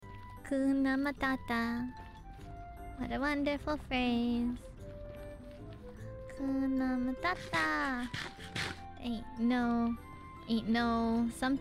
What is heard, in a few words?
Singing; IRySinging